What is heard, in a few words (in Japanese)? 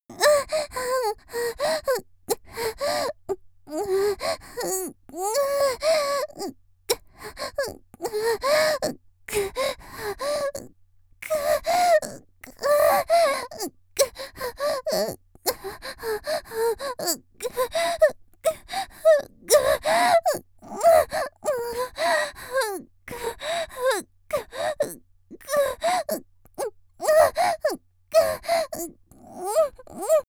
「喘ぎ」無理矢理されて嫌がる; 30秒; 綾波系少女